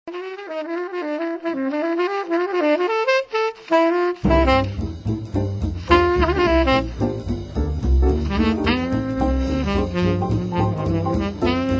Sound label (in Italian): ad lib blues, chitarra